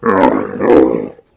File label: bite; wug